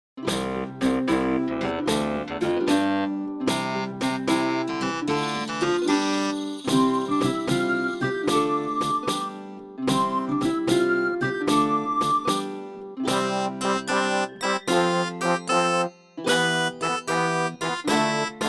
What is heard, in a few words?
hundreds of years ago instrumental; gunpowder plot